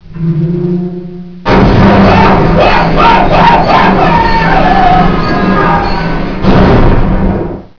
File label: elevator fall; ambience